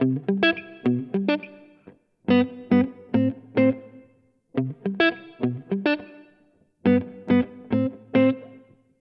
chill 105bpm Gmaj

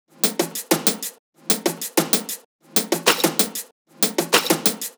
95 Tops

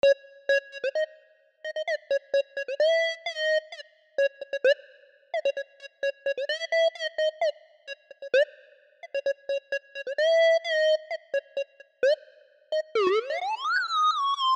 LEAD

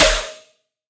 snare tonal

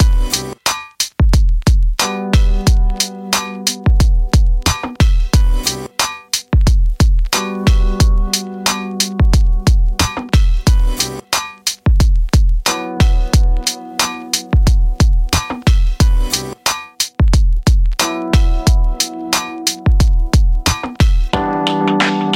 Crappy Drums

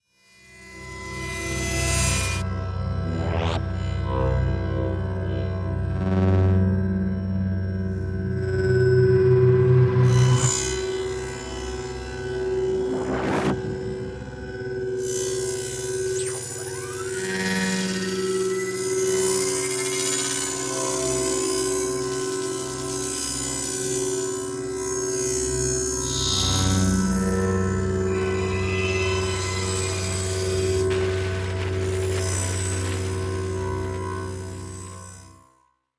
Waves; Astrarium

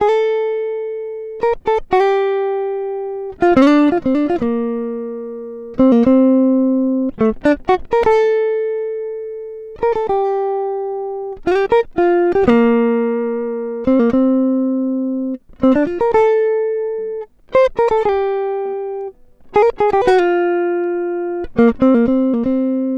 119 Gm Elec Guitar

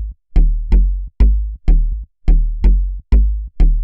Bass 125bpm D Synth Sub

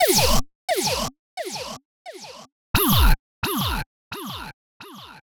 fx

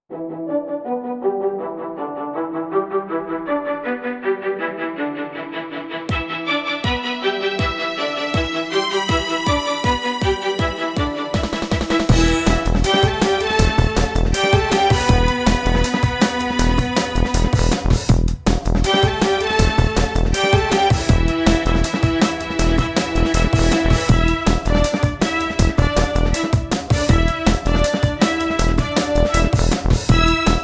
Drum and Bass Violins

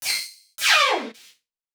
Lead Em Tail

